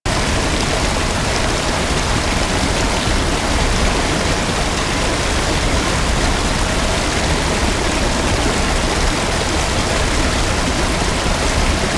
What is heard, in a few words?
Forest Waterfall Huge; Ambience; Props; Warframe; Grineer Forest